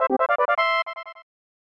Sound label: Key Item Fanfare; earthbound snes